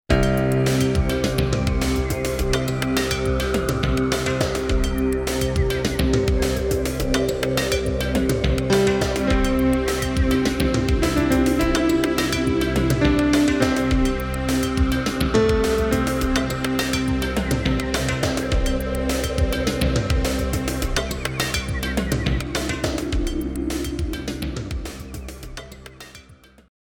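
acoustic guitar 1k attenuation, 9dB attenuation at 1kHz; Mixing for God audio examples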